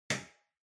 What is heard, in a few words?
TV Hardware Far Switch